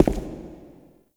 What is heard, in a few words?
Shadow Figure Steps; Warframe; The New War; Characters; Zariman Shadow